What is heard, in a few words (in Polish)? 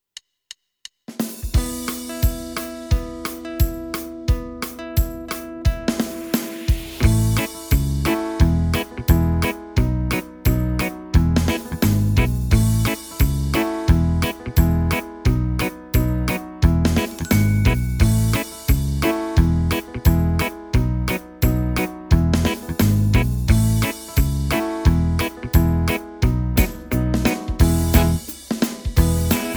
WERSJA INSTRUMENTALNA